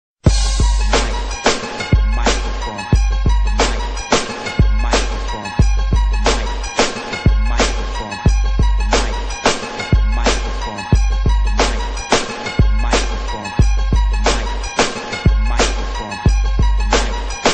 Microphone Beat

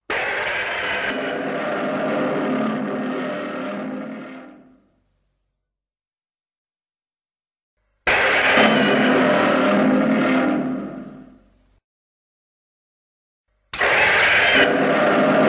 roars; Gorosaurus

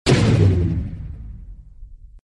Gun proton failed

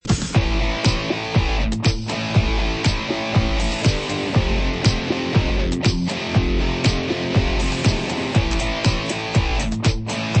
Nay Guitar; meloboom

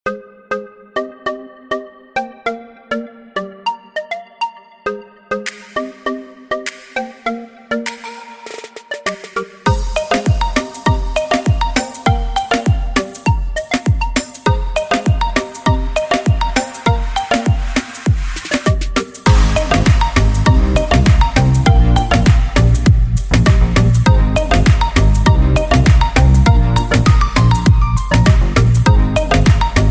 moombathon

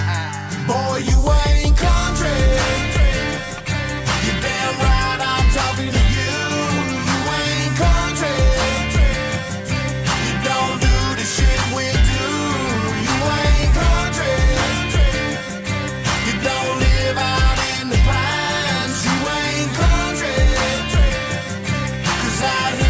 country

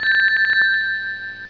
Sonic Loses Rings

